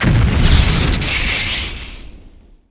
heart hit; The Elder Scrolls III Morrowind GOTY; XBOX

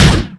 Mach Gun End

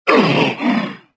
pain; galak

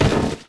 stone bounce

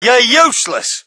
crowd, shout; Mike Tyson Heavyweight Boxing

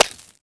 Bullet Stone, Impacts

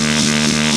panic pitch down eq, Engine

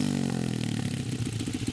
Decel Cross Fade, Engine

